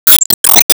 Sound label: Enemy Poisonwizard Mirror Crash; gamerip